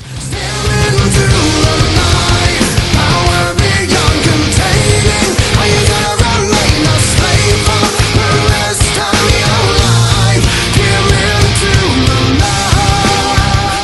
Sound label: metal; roundsound